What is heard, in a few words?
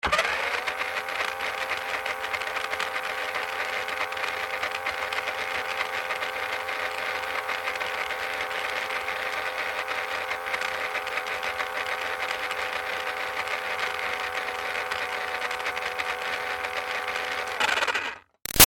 Toy Bounce Back Racer CU Run Long, toy car; Big Room Sound